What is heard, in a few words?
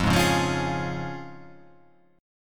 F Minor Sharp 5th; x,8,6,6,5,8,7,5